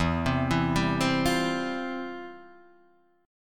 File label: F Major 7th Suspended 2nd, E, guitar